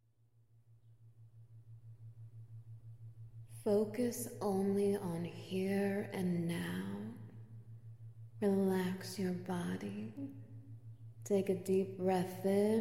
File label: 8D Binaural